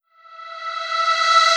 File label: reverse, vocal sample